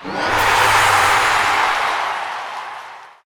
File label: crowd score; svencoop; thearena